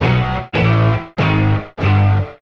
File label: guitar four strokes, Loops